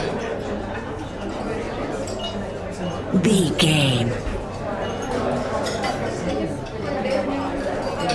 background people restaurant cafe noisy chatter talk loop